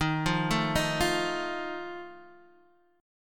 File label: Eb Suspended 2nd Flat 5th Eb Guitar Open D